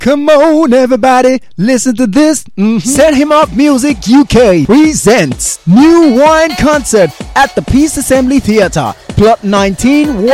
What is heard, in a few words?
Jingle; New Wine Concert